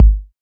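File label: PER PROCU, Procussion, percussion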